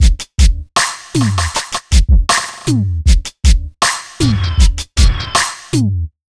BT; Ragga & Dub Reggae; USB Soundscan vol.27; AKAI

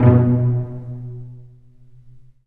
Cel Pz B1; Digital Sound Factory Virtuoso

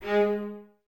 String Ensembles, Violas, VLS Detache; Miroslav Vitous

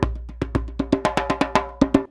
119 DJEMBE, Modern Percussion Loops; USB Soundscan vol.56; AKAI

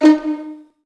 PZ D#1, strings pizz; ILIO; Kirk Hunter; Virtuoso Strings

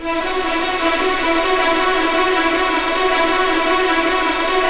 RIVER; East West; Scoring Tools; ORC; River Flow

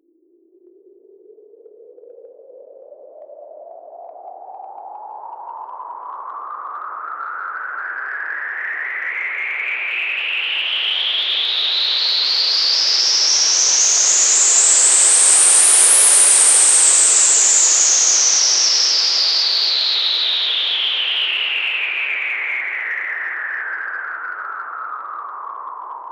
Breakdown FX, Underground UK House & Electro

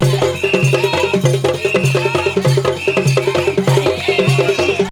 DANCE, ETHNIC JOURNEY, AFRICA

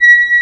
AN SYN, SYN & LEAD; SAMPLE WAVE MIXING 2

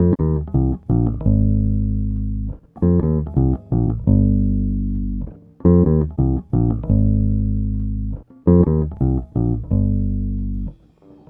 Bass, 70s Analog Funk

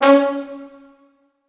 C#3 L, Orchestral Brass

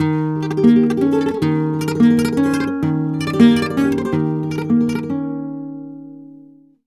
Flamenco, Guitar, Tremolos, 171, d#

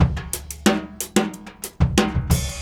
DRUM 90

